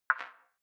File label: click whistle up low, signale